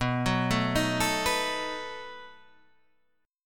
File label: B 7th B down Guitar Half Step Down